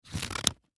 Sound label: Scissors Cut Paper Single; Blastwave